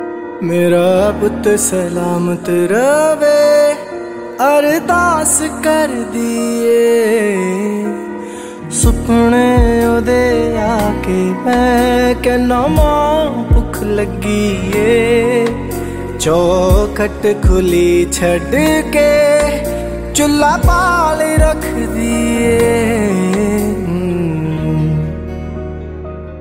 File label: bollywood ringtone